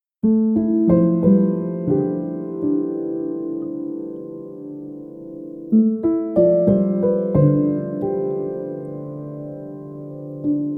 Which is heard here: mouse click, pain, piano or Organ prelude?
piano